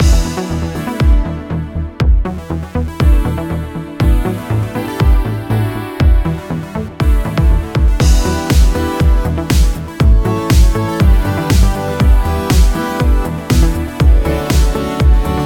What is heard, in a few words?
Mellow Mix Loop